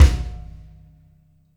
Combo Shard, Processed Drumkits

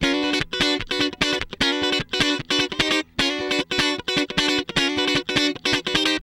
Guitar Licks

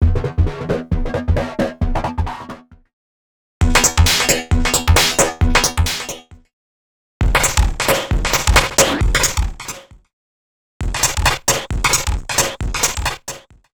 Overdrive 133.5bpm; X-Static Goldmine 5

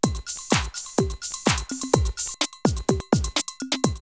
126, drumloops, Techno&House; Schneider; X-Static Goldmine

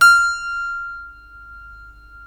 F 5 CLAV; Keyboards Acoustic; AKAI; USB Soundscan vol.17

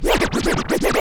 FX scratch

